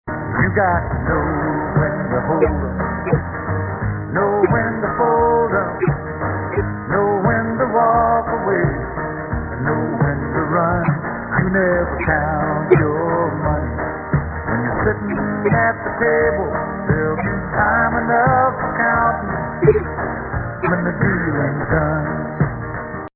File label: 04kHz, Aliasing Examples